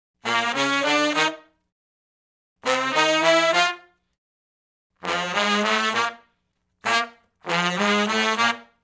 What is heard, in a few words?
R&B Riff IV, V, I (Bb) unison, Horncraft for R&B; Sections; Sonic Foundry (Sony Creative Software)